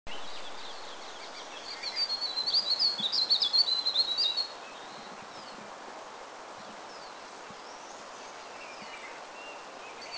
Verditer Flycatcher; Dirang; India

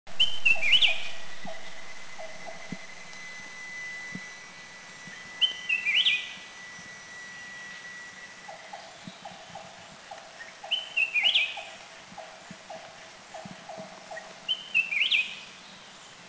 Black-crested Bulbul, south Thailand

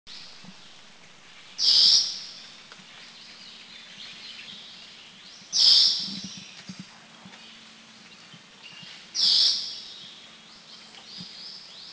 Blue Whistling Thrush, Thailand

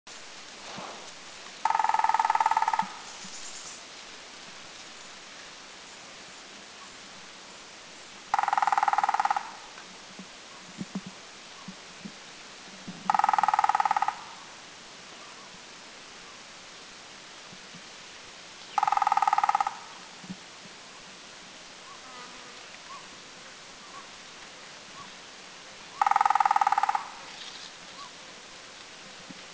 Crimson-breasted Woodpecker Drum, Doi Lang; Thailand

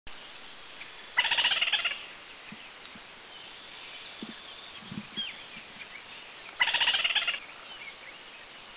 Wards Trogon Alarm Call, Dirang, India

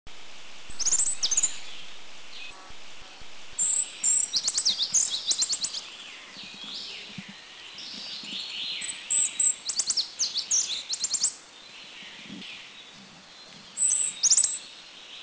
Ferruginous Flycatcher; Muscicapa ferruginea; Blue Gate track; singing; Taiwan; 2 birds seen well Blue Gate track, 1 was singing here

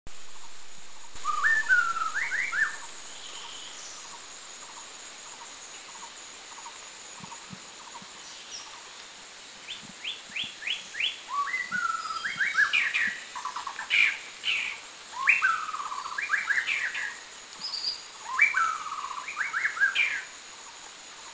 White-rumped Shama; Mishmi; India

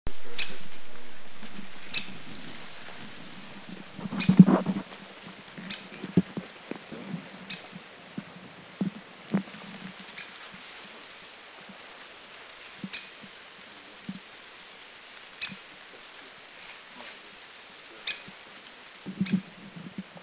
Stripe-breasted Woodpecker; Thailand

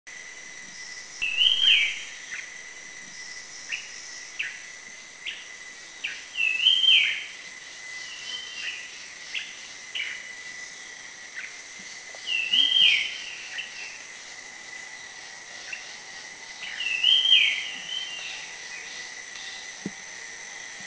Puff-throated Babbler, Thailand

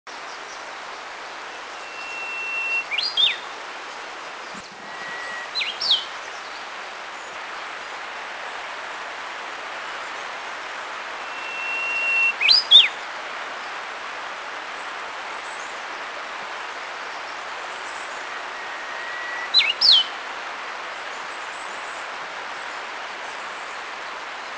Brownish-flanked Bush Warbler, China; Birding2asia

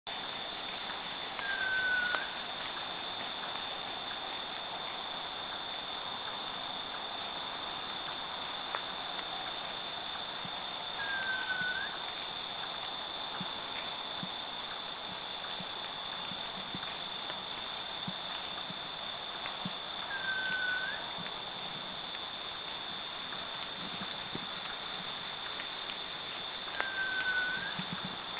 Javan Frogmouth Whistle

